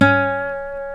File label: C#4 nylon, Guitars